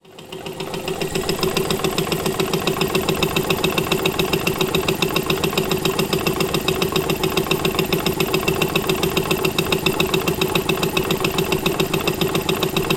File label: Sound Walk